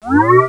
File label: Air Raid Siren; 1997 MMX LAN PACK (win 95); Army Men